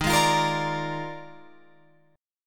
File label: Eb Major 7th Suspended 2nd Suspended 4th Eb Guitar Open F; EbM7sus2sus4; strummed